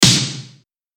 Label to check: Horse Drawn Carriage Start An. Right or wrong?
Wrong